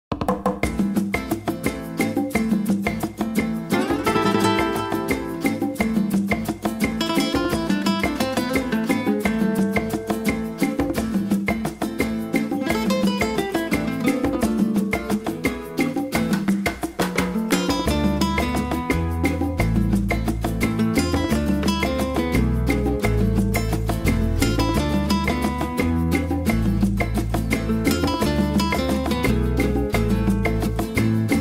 Amazing Chill Guitar; CHILL OUT SUAVE

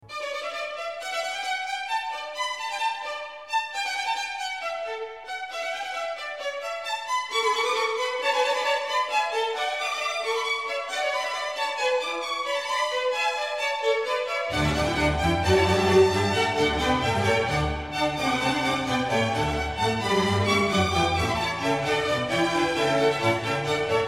Concerto Grosso No 5 In D Ma; Feuerwerksmusik, 3 Concerti Grosso; Concerto Grosso No 5 In D Major

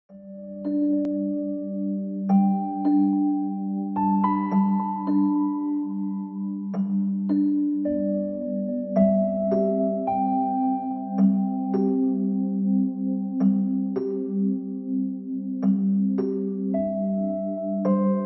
Piano Relaxation Rainforest; hotusa spa

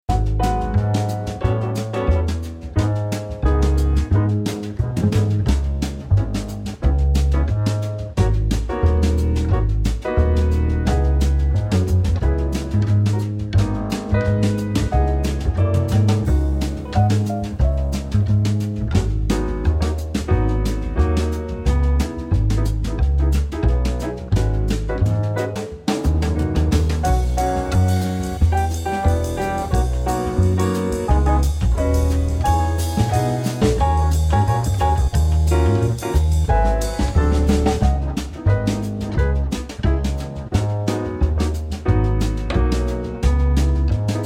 Jazz Instrumental, Jazz Calming

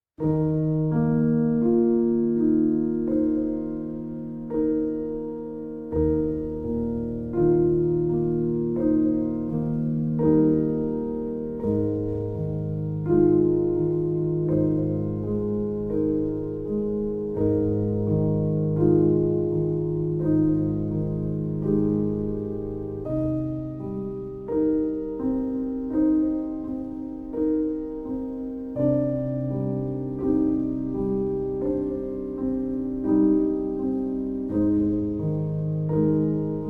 Piano Relaxation

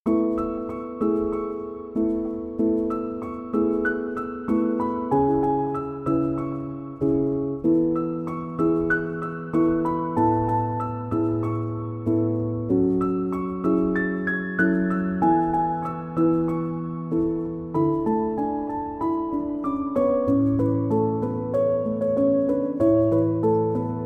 Piano Instrumental

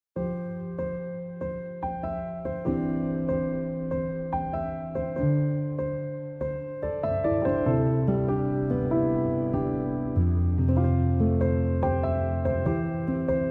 piano instrumental

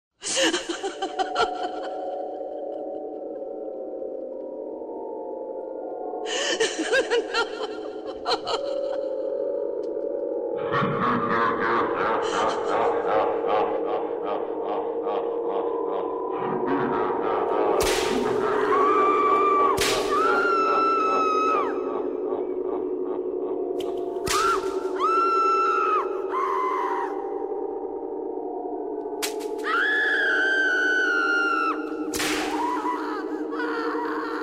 Laughing, And Crying, SONIDOS TERROR

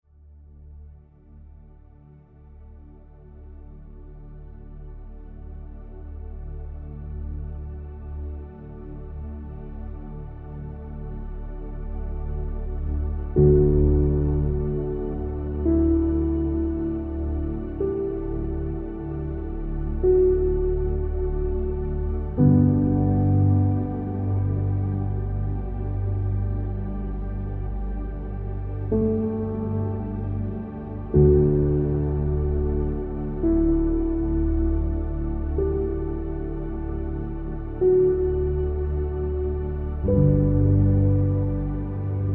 Inspiring Ambient Soundscape; hotusa spa

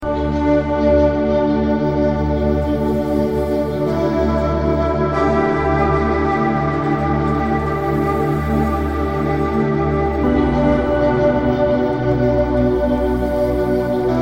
Nature Sounds Nature Music

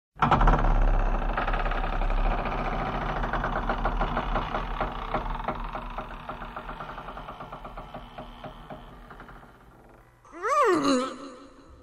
Close The Door; SONIDOS TERROR

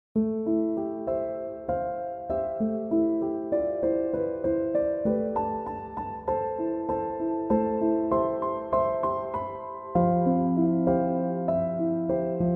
chill piano instrumental

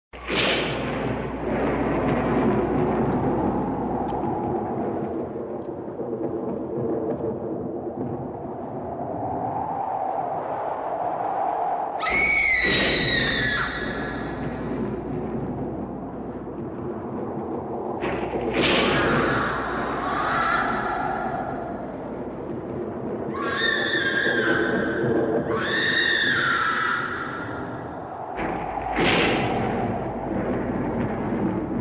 Thunder And Lightning; SONIDOS TERROR

